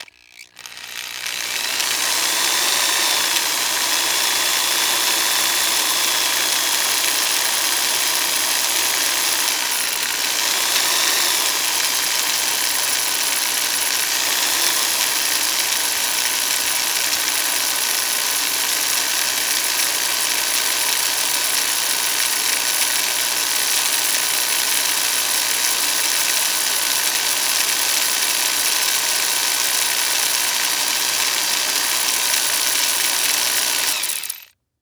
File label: Industry Tool Drill Power Drill Spinning Medium